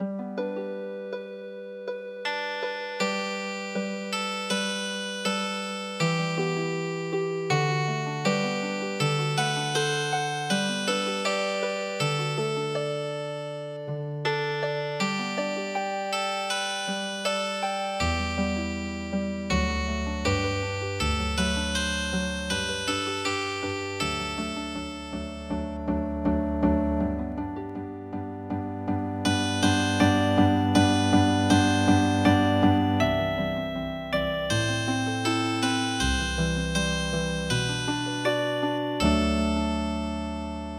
Healing Harp, traditional; Sandmannchen

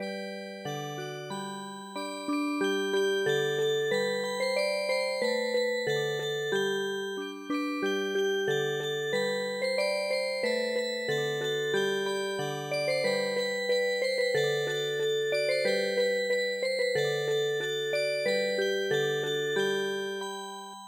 Music Box

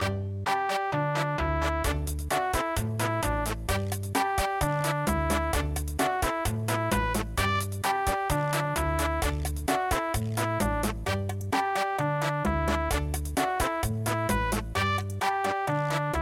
chachacha

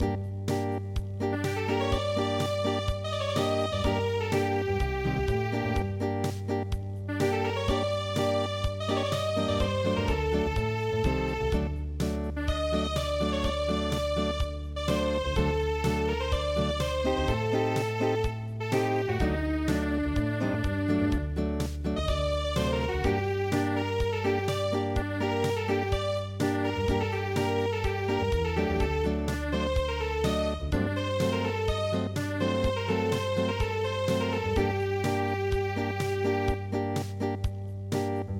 Acoustic

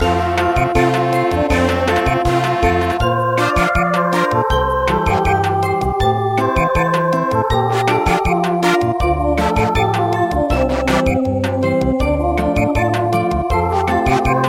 Samba

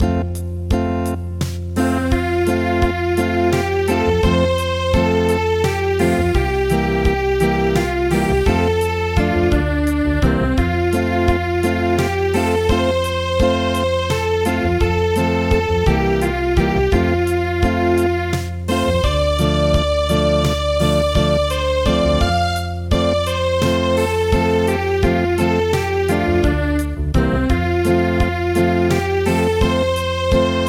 Acoustic, traditional

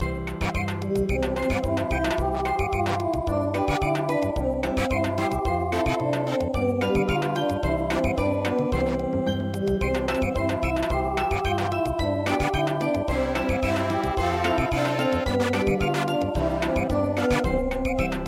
Samba

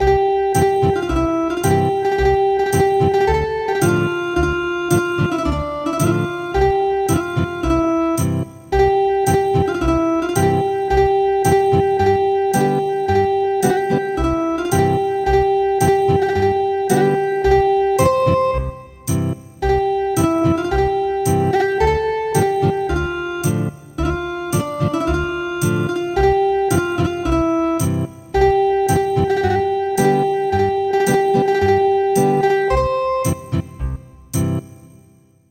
Ethnic Guitar, midi; traditional

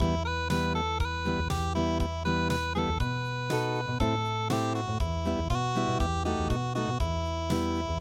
unpluged

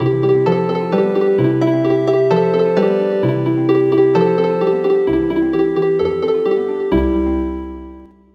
Healin Harp

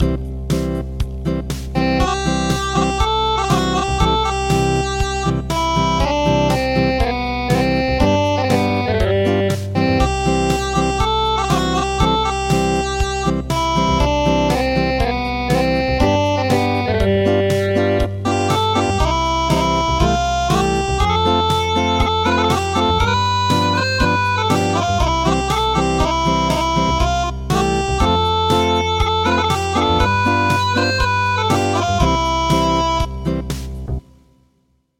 Acoustic; That Little Bunch Of Flowers

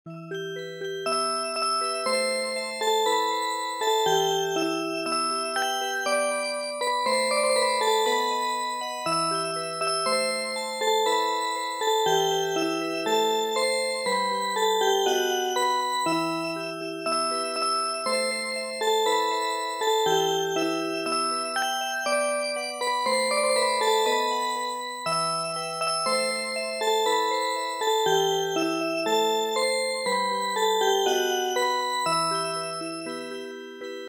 Music Box, traditional

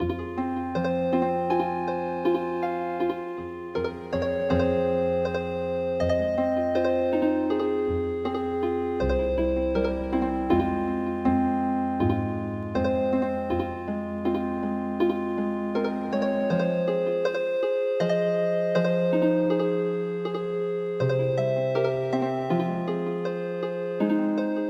Healing Harp

